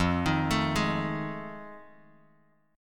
F 7th Sharp 9th, Bass Standard; F7#9 arpeggiated

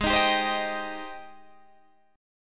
Ab Major 7th Ab down Guitar DADGAD

